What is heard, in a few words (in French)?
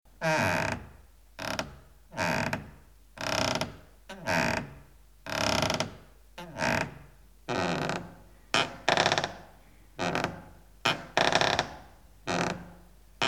Balancements